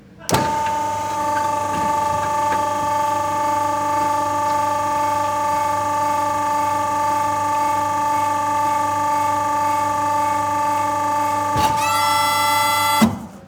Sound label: Cardboard Press Up